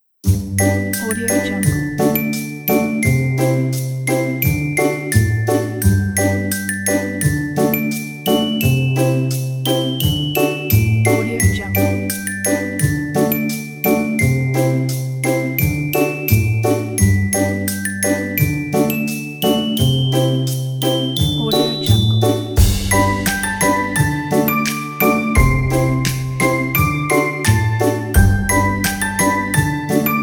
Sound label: christmas winter mood